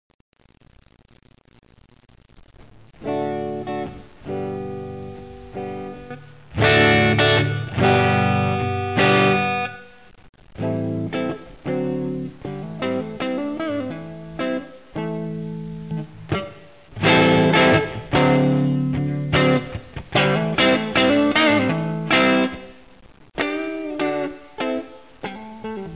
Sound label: dirt strat; AMZ FX